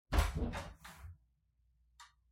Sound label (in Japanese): 扉が開けれる音